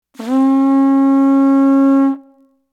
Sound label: Strombus Toot; chavin